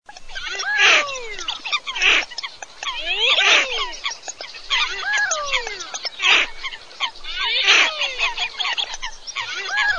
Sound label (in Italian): MORIGLIONI FOLAGA